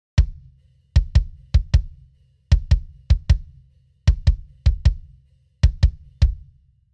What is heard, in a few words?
distortion kick off; MannyM